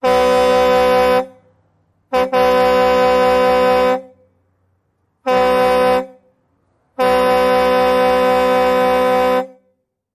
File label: Truck Air Horn Loud